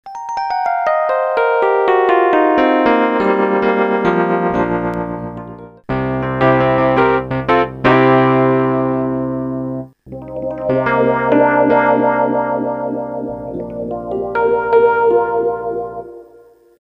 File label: rhodes